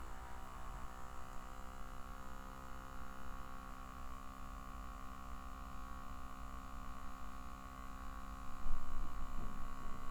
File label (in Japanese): バイブ音